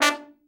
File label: trombone; Best Service; Killer Horns